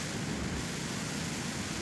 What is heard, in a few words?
Mech Booster Loop